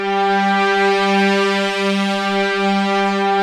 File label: Prophet 5, G4; Hollywood Edge - Giorgio Moroder Rare Synthesizer Collection